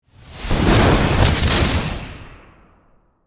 Magic Spell Build, Horror, Magic, Spell, Build, Up